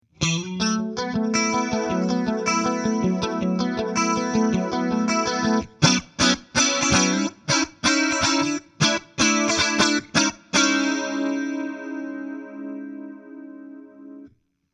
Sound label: Jazz Clean